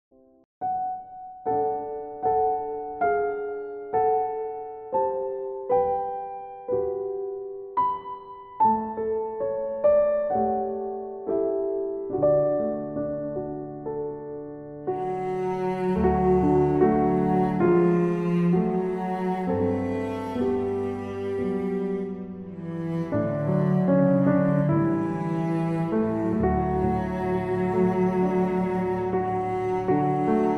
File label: heavenly christmas music instrumental christmas songs cello piano; CANTARI